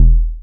BD drm; EMU Orbit-3

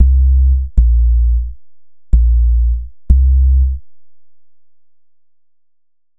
Bass, Futurist Drum & Bass

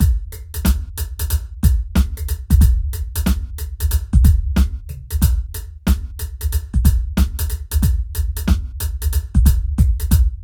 PHATT BEA

